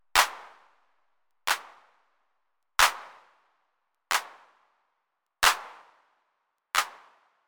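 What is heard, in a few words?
clap; Yocto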